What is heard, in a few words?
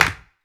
CLAP, Human Perc, Body Perc